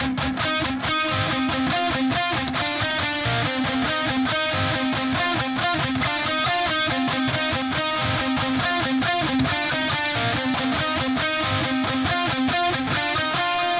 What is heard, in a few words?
Drums and Jingles Camping Lead; Metal Tales Fury of the Guitar Gods